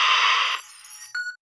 Messages End; ImpGal2; screens messages

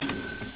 sea

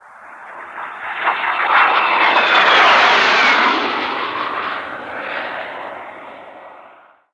planet re entry; Earth and Beyond; EA Games

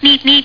roadrunner

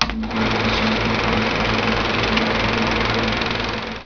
OIL CARS

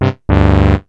WRONG; EARTH

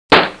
Gunshot

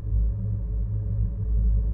mall loop; progen; Earth and Beyond; EA Games

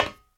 Metal; kult

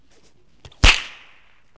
whip; Wired for Sound Pro CD; Aristosoft, Inc.; impacts

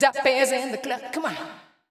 VOICE GRL HOUSE 125 A, House Voice

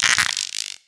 scarab hit; DAoC

